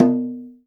GENDANG D5, GENDANG DRUM; Spectrasonics; Heart of Asia

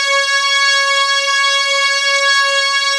OMNI REEDS, ARP Synths; E-MU Formula 4000 Series Vol. 3; Analog Odyssey; ARP Synths II

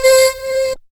8 Bit Vox; Zero-G Jungle Warfare 1, 2 & 3

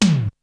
Tom, Drums; ZERO G - Datafile DISC 2

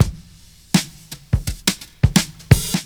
OLD SCHOOL, Breakbeats; USB Soundscan vol.46 - 70 s Breakbeats; AKAI

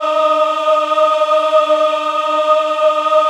D#4 MW AH, Choir; USB Soundscan vol.28; Acoustic & Synth; AKAI

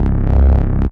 C Bass, DnB One Shots

